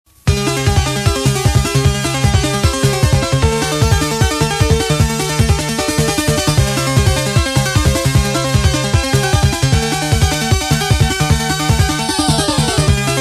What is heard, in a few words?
inside of battleship bgm; Chiptune; Gradius Collections (OST); Gradius 2 - MSX Version